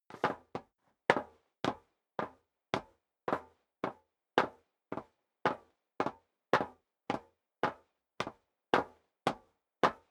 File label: step sneaker metal dry upstairs sequence